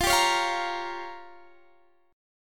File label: G Minor 7th Flat 5th